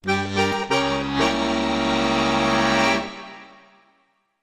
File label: Accordion Suspenseful Bridge